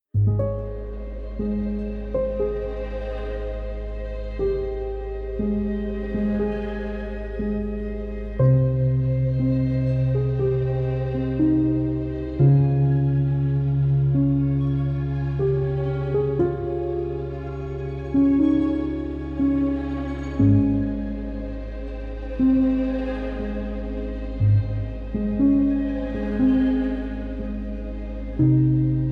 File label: Alpha Waves Sounds of Nature Relaxation Calming Baby Sleep Music